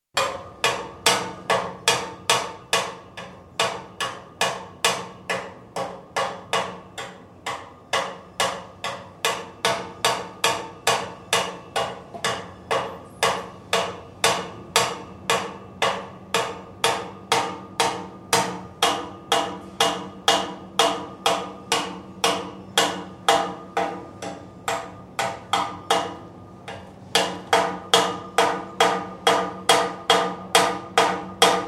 Building site, hammering steel girders, close perspective; Construction; BBC